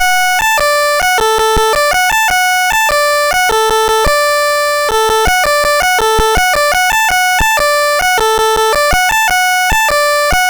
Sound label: Bugle Call BASIC